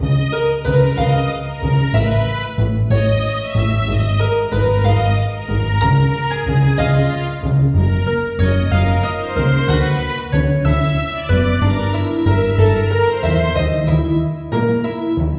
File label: Sneaking Sneakily (Orchestral, 124 bpm); Ultimate Everything Music Bundle; Loops for All Occasions